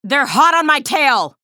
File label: pain ability damage; athena; low health